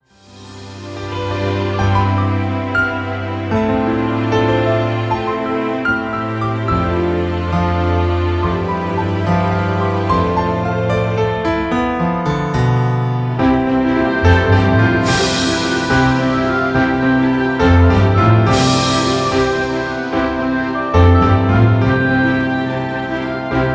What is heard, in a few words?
Waltz